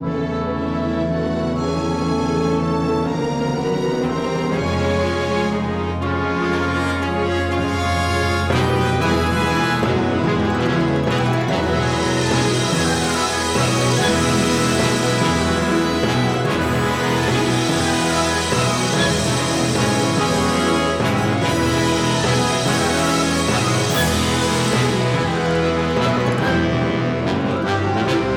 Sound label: for Tuba and Trombones; Respighi; La fontana di trevi al meriggio